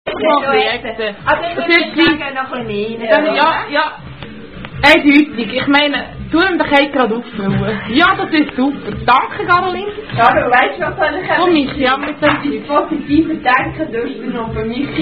Trinkspiel, German Drinking Song; Unknown German Drinking Song

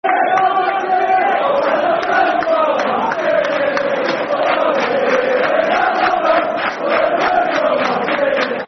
Football Chants (Soccer Songs); When The Blues Go Marchin' In; Man City Mcfc

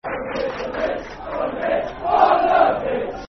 Football Chant (Soccer Song); On The Pitch; Brentford Bfc

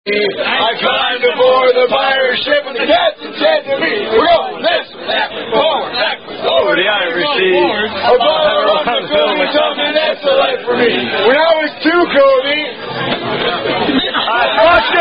Rugby Party, Over The Irish Sea (Rugby Song); Lockport

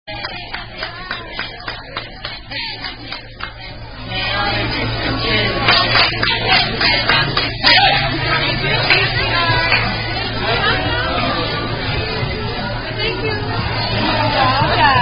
Birthday, Happy Happy Birthday

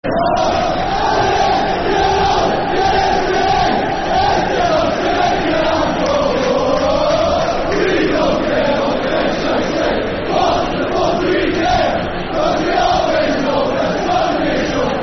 Football Chants (Soccer Songs); It's A Grand Old Team; Everton Efc

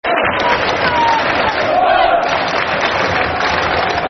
Boro Clap, Football Chants; Middlesbrough; Middlesbrough Mfc

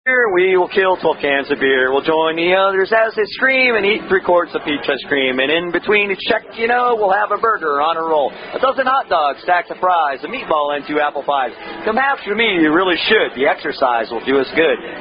Sung; Come Hash

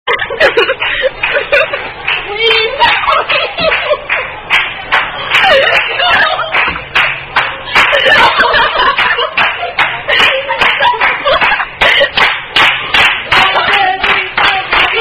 Happy Happy Birthday; Chili's

